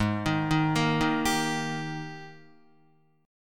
Ab Major 7th Suspended 2nd Ab down Guitar; arpeggiated

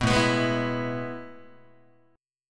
A Major 7th Suspended 2nd, Guitar; AM7sus2 strummed; 2,5,2,6,3,3; JGuitar

